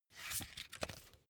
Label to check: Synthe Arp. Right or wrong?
Wrong